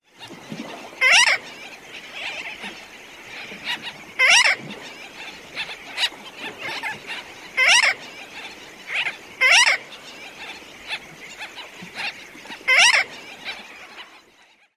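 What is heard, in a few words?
calls of individual, Sooty Tern; Dry Tortugas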